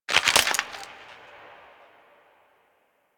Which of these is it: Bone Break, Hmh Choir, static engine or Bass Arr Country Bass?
Bone Break